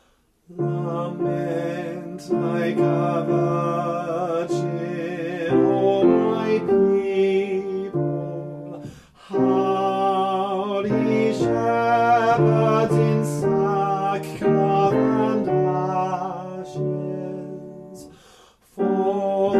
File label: soprano